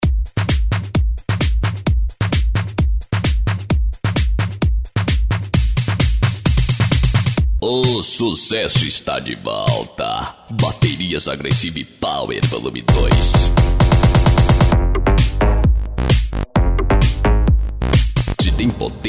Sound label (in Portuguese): Baterias, som automotivo; Baterias Agressive Power Vol.02; som automotivo raiz; Open Format